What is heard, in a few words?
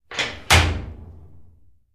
Vault Outer Door Lock; safes & vaults